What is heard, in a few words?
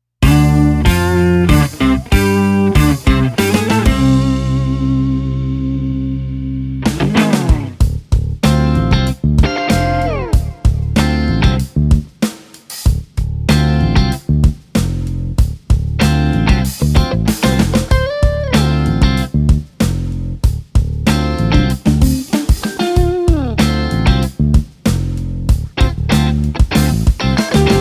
GTR; Funky